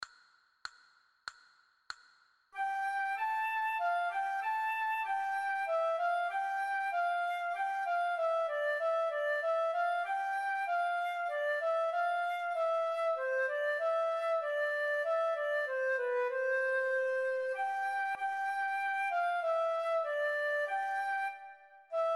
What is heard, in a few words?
passepied, flute a bec